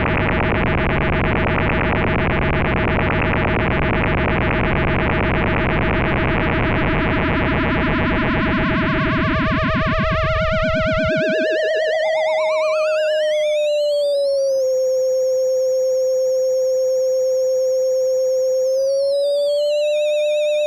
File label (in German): Studio Electronics STE.16 LFO Frequenzmodulation